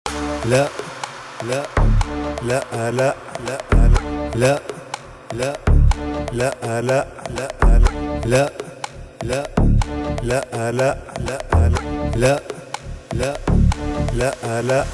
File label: arabi remix